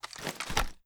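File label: weapon foley assault rifle raise; gmod; viper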